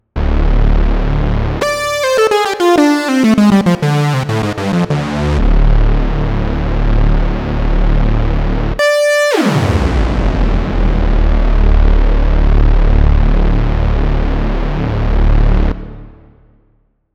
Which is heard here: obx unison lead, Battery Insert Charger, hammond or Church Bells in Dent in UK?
obx unison lead